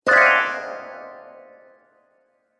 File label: Fairy Star Magic; Magic Sound Effects